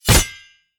sword Hit; halloween

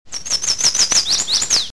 bird; Solitaire

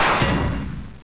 crash, mad cars

